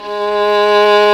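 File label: Vln3 legato vb; STR Violin 2&3vb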